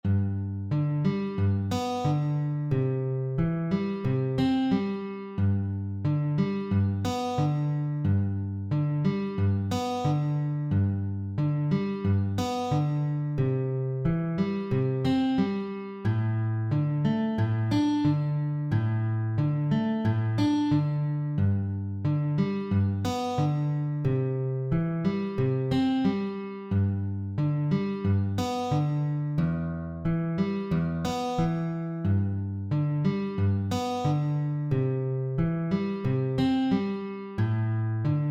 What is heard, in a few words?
picking